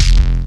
kit; Rubber Chicken Software Sample Bank

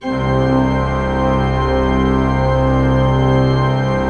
C1, Cathedral Organ

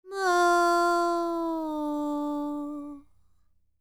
World Voices, INDIA; ILIO; Vocal Planet

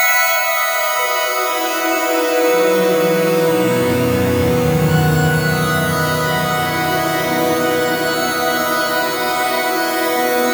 pad cascade; Sonic Foundry (Sony Creative Software); Ugly Remnants VOL-2

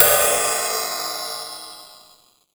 Big China Crash, Drum Hits; Zero-G; Soundclash

